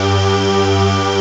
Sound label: ORG G, ELIXIA ORGAN